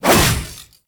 Whoosh; Warframe; Weapons; Tenno; Revenant; Nikana; Tatsu Prime

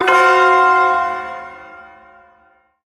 Shawzin Hirajoshi Chord; Hirajoshi Chord G; Warframe; Duviri Ersatz Shawzin; Chords

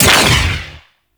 Explosive Impact; Weapons; Sapient Primary Explosive Impact A; Warframe

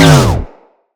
Osprey Shield Funnel Stop; Characters; Corpus

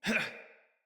Attack, Battle Barks; Envoy

